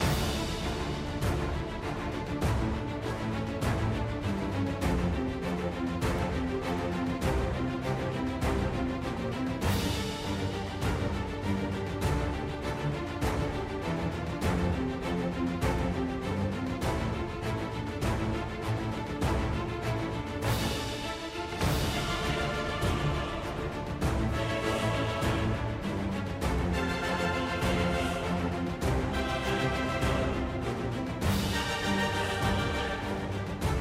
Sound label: Free Spin BGM; Fortune of Olympus